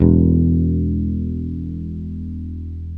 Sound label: E1, JAZZ BASS